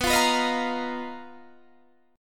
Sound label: B Major 7th Flat 5th B down Guitar Standard; BM7b5; strummed; JGuitar